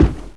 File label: far foot heavy, antlion guard; npc; Half Life 2; Episode 1